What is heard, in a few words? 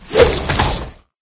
attack novoc, maggot; doom3